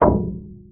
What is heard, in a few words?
collision; super space game